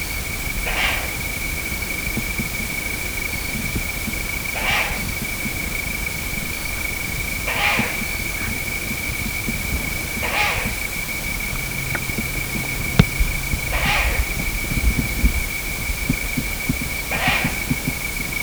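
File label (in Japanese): musasabi, ムササビ 鳴き声; 夕方の出巣後に「グルルルル」と鳴く声を聞くことができます。; 上野動物園; 齧歯目類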